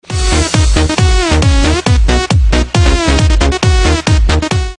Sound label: techno